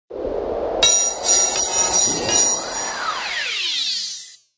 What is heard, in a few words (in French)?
AMBIANCE; pack noel; SOUND-DESIGNS